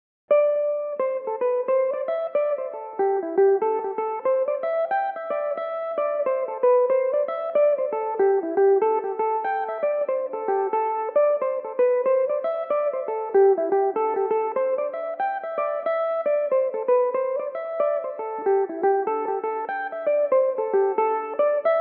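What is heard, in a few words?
A dorian, irish mandolin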